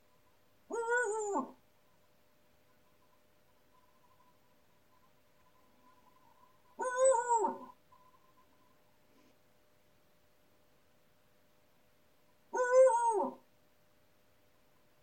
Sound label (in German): Uhu ruft